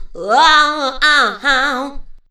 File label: WOH HA HA, Funky Vocals; USB Soundscan vol.18